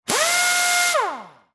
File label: Industry Tool Air Gun Run Medium